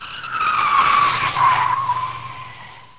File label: skid; Story Maker